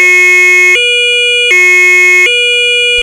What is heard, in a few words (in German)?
Polizei Land, sirens german